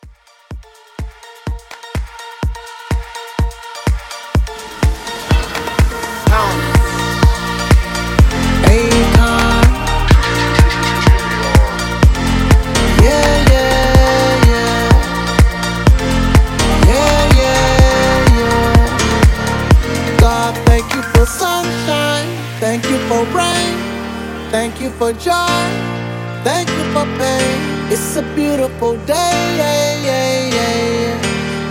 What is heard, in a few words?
House Mashup, 125